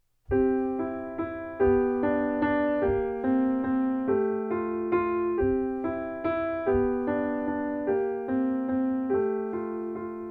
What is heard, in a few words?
little waltz